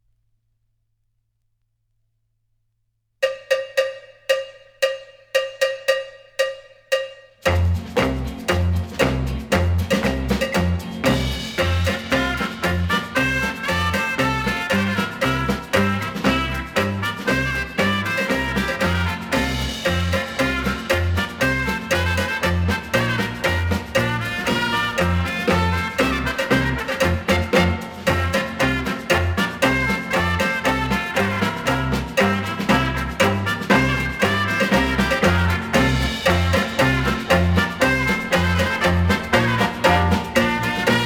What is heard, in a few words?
cha polka